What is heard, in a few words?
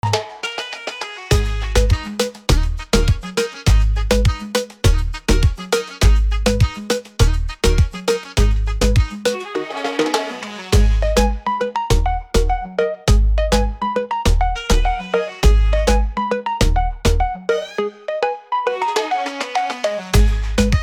jungle sax